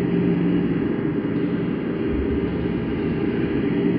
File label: sparse soundscape samples; ReLooped